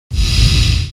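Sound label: zombie iki, zombie breath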